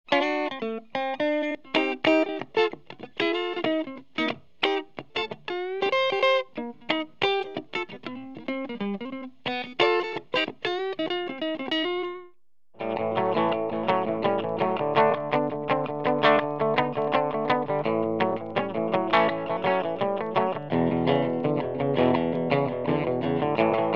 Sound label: clean Crown D-75